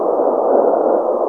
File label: grenadelauncher projectile; weapons; Tribes2